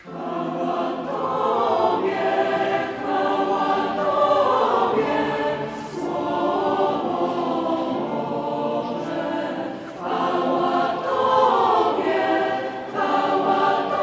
Chwala Tobie Slowo Boze; warsztaty muzyki liturgicznej anima christi srem 15-17 marca 2024

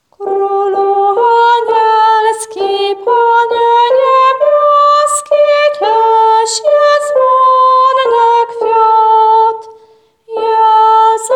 sopran; Krolu anielski; Boze Narodzenie

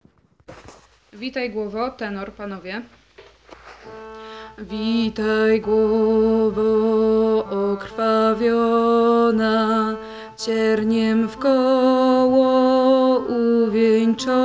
Tenor; Witaj Glowo okrwawiona; piesni wielki post

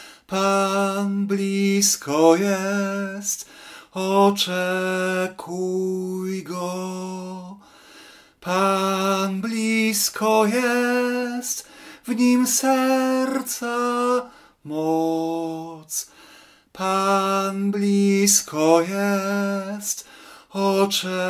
Sopran; Taize

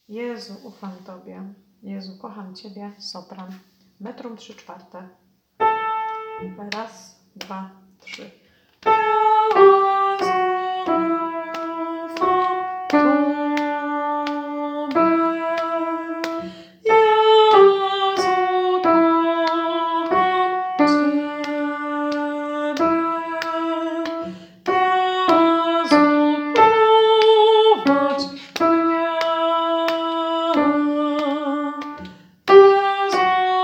sopran; Jezu ufam Tobie kocham Ciebie

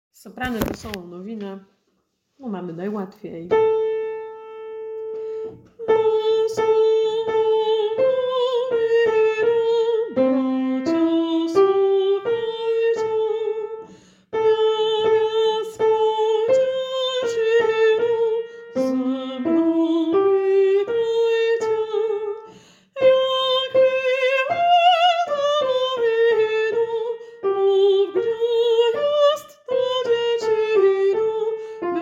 Wesola nowine bracia sluchajcie Sopran; Boze Narodzenie

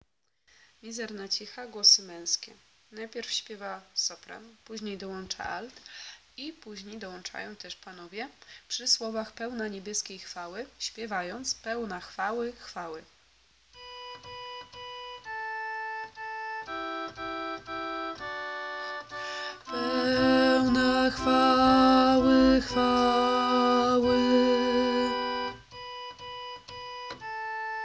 Mizerna cicha bas; Boze Narodzenie

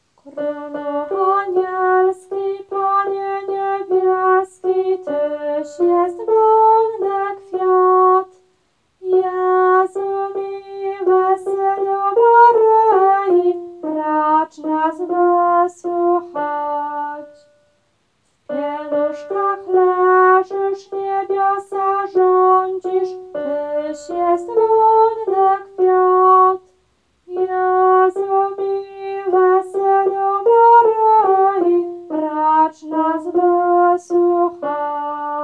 Krolu anielski alt; Boze Narodzenie

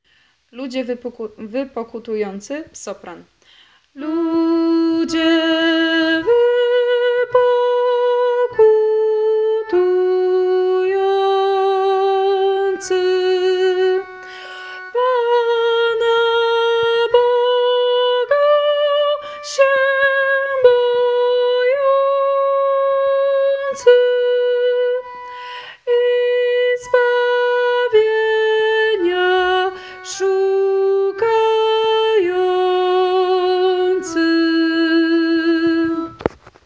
sopran; Ludzie wy pokutujacy; piesni wielki post

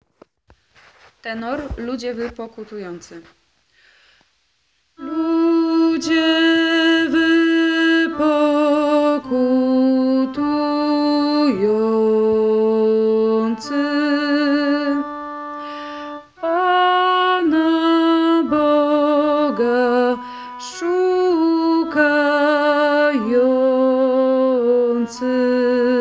tenor; Ludzie wy pokutujacy; piesni wielki post

